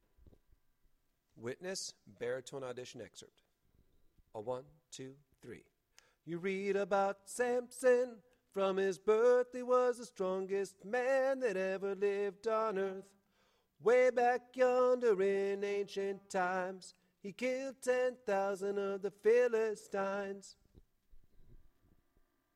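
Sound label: baritone; witness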